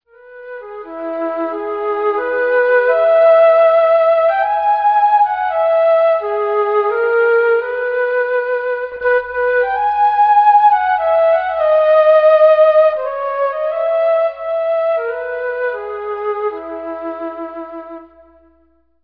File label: melody